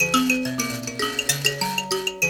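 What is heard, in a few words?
grand mbira phrase, African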